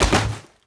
bodyfall stone, foley

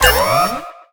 FEVER COUNT; Puyo Pop Fever

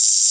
Zoom Loop, Sniper Rifle

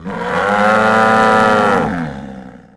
hit cow; animal; Tony Hawk's Project 8; XBOX

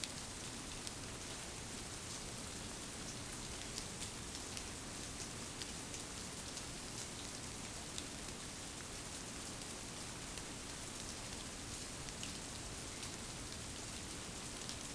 rain on dirt, ambience; Star Wars Obi Wan; XBOX

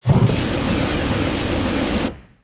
balloon; Ambient; MX vs ATV Unleashed

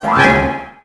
Hit

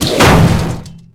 starting gate; MX 2002 Featuring Ricky Carmichael; XBOX

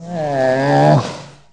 Hoot, Aliens

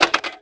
cursor deselect; Tony Hawk's Project 8; XBOX; moveable pieces

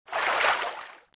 water wade, footsteps

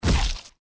head explode, bullet impacts gore; Soldier Of Fortune II Double Helix; XBOX

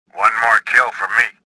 victory; swamp2; Star Wars Jedi Knight II Jedi Outcast; XBOX

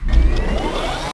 start, ships; Quantum Redshift; jordan

